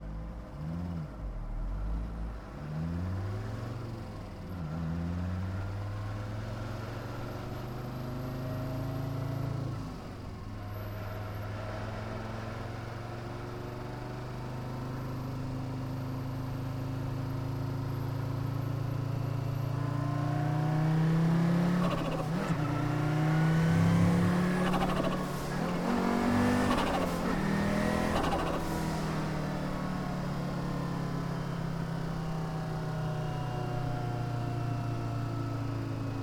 Audi Quattro - ONBRD - GEARSHIFTS - Drive - ENGINE - Center; MKH8020; Ur-Quattro 1983; Pole Position